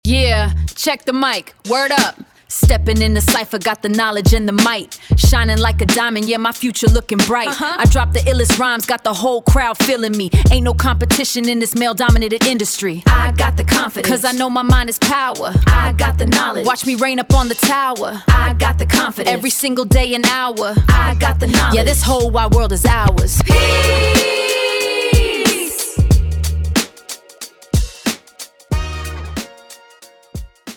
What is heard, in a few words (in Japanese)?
90年代のラップ; 実際に生成された音源; Lyria 3; Gemini